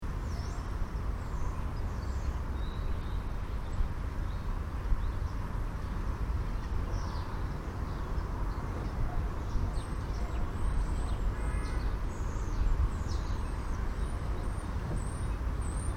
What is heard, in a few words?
Park (In Town) Ambience: Birds, Road Noise In Background; Outdoors; Backgrounds; Outdoor Sound Effects; Stuart Duffield